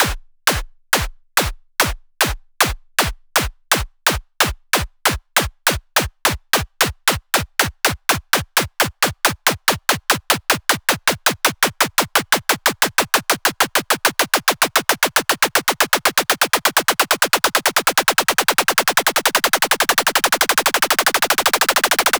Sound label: 128BPM Accerlator Snare; FX Fills; Vengeance Samples